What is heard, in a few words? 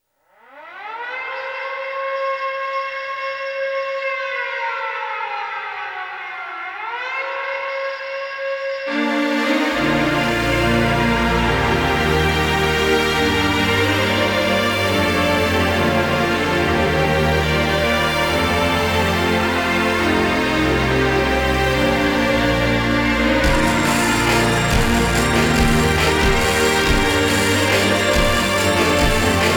Karaoke Version